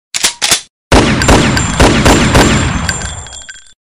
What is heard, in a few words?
loud gun fire message tone ringtone